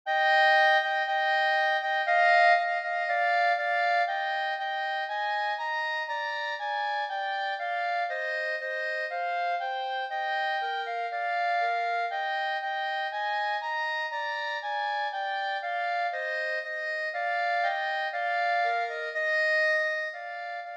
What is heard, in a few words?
flute duet; ode to joy short